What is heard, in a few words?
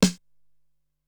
Thump Snare, Drum Machines Ensoniq SQ-R-Plus; 300 Drum Machines